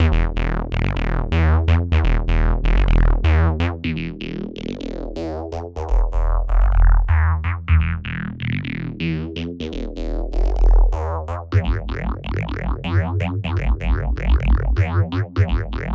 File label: Analog Bass; Whirl